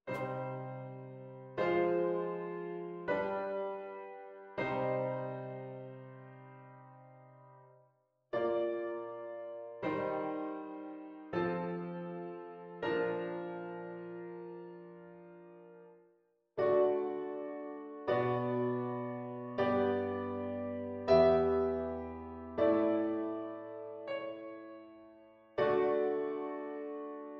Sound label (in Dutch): vierstemmige zetting; slotwendingen